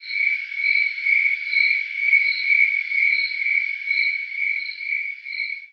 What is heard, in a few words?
crickets, ambient outdoor